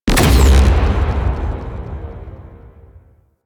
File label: Shockwave Leap Stomp Explosion; Warframe; Venus; Venus Terra Shockwave Moa; Abilities